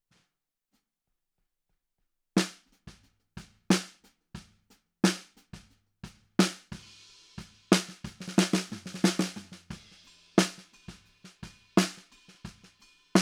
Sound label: Snare Bot